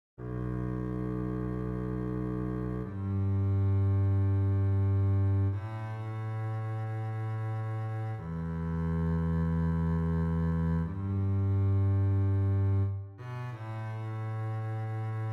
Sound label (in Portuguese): Contrabaixo; Balade